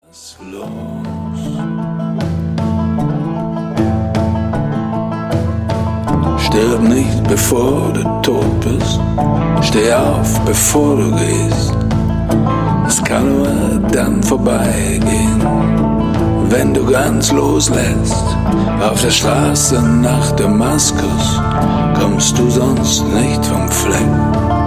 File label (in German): Banjo